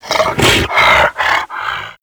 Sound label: Infested Juggernaut Vocal Idle; Warframe